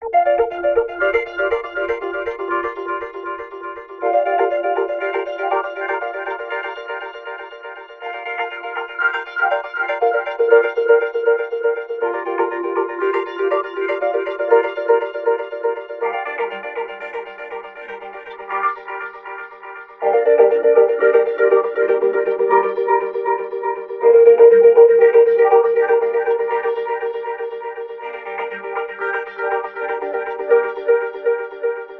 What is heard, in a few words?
Deep Pads